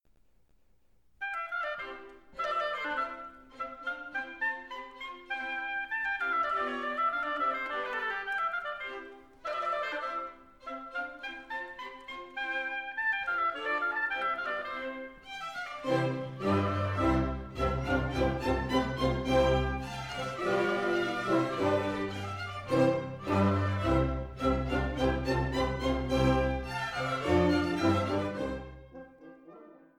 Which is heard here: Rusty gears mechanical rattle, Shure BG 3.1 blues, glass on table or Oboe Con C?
Oboe Con C